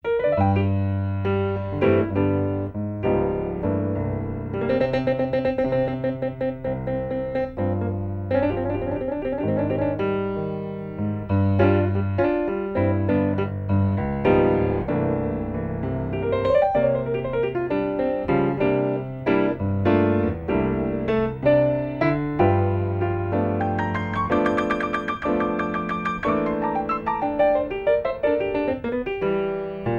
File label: Dirty Blues